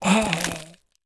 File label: Bone Warrior Death